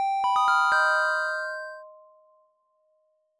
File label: item get minor